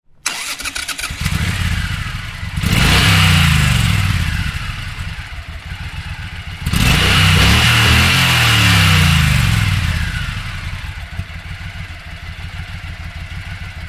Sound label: Vehicle